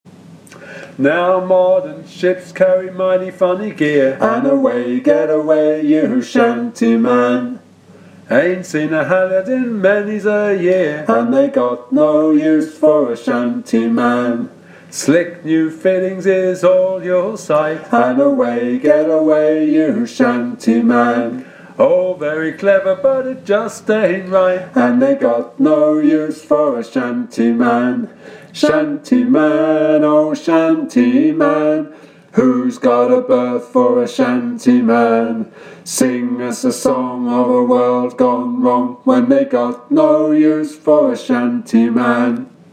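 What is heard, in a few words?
Shantyman - alto, sea shanty teaching track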